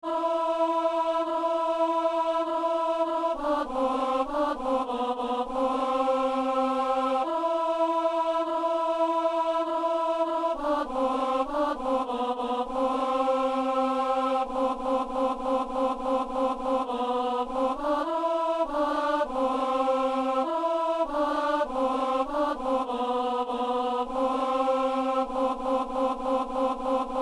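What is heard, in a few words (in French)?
ténor; messe de l'ermitage; sanctus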